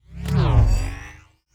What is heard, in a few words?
wooshes transform sweetener